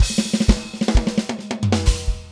Drum Fill

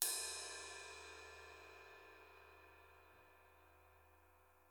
Prac Ride

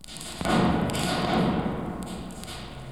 Background Industrial Ambiance

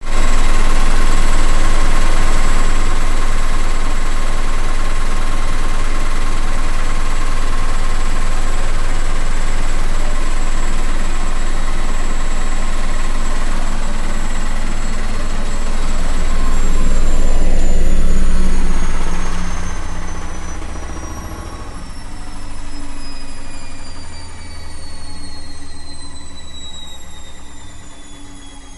wash machine High Spin

